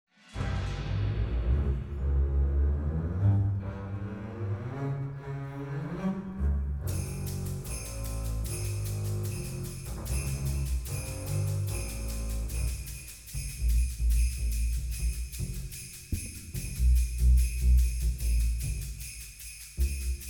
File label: Bass Solo Adventure Theme